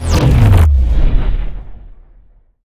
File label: Grenade Gravity Explosion